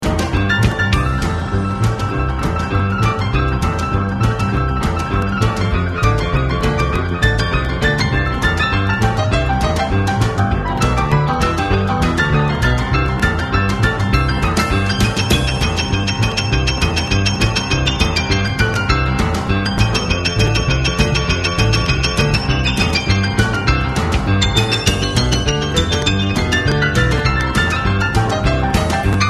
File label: SWING